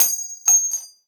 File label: Shell heavy default; STALKER; IWP